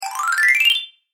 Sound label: Cartoon Marimba Slide Ascend Musical Chime